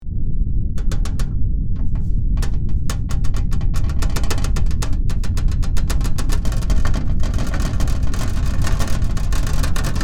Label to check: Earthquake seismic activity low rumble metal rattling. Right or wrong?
Right